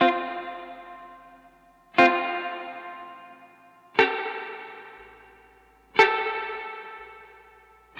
Guitar, 70s Analog Funk; Sonic Foundry (Sony Creative Software); What it Is!

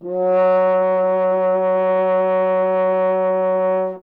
F. Horn; Miroslav Vitous - Solo Instruments VOL-2; Solo lnst. II

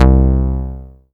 Rubber Bass; Best Service Gigapack 2

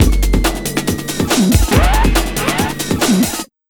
Big Beat Drumloops, SPEED 140

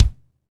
kick, lite jazz, Roland; Northstar; Drumscapes Roland; AC Lite Jazz; Kicks

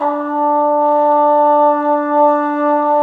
BRS TRUMPETS, Roland LA

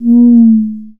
HI TUMBA; Conga Battery; E-MU Formula 4000 Series Vol. 4; Earth Tones

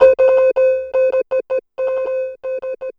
SYN LP, 160 BPM, Drum & Bass; Ueberschall; Drum & Bass Flash Trackz

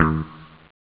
funk gt; jazz jackrabbit 2; M8

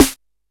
KOMMERZDRUMS

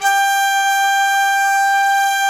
mute flute, hybrid winds

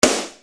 Snare Dr

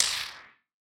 Wider Clap

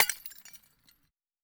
glass

